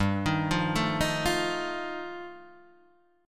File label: G 7th Suspended 2nd Sharp 5th G chord, Guitar Open D